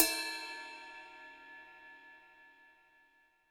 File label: HiHat & Crash, Roland TD-20; HiHats